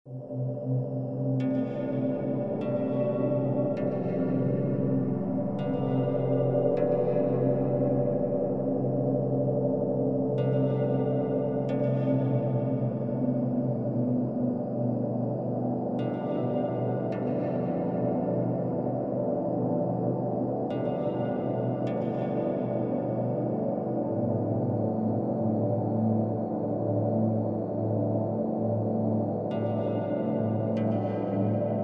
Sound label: Amb Sound Scape Harp plucks, Deep space wind; Ambient SoundScapes